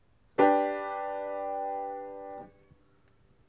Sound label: piano re so si